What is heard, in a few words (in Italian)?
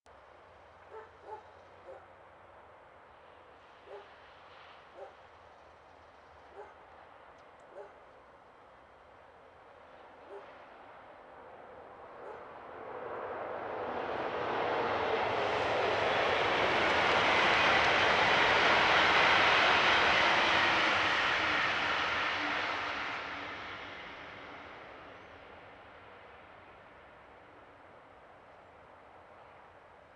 Treno mono; Eigenmike; A-weighted; Nuova Strada